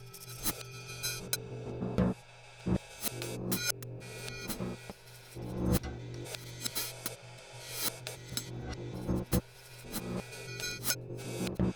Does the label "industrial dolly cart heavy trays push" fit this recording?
No